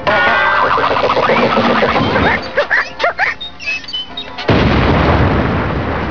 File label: toon noise